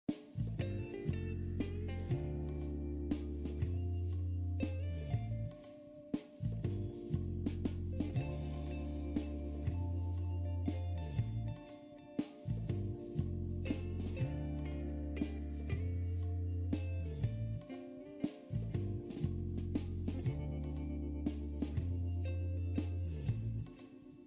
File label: short music loop